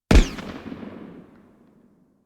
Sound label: gunshot and echo long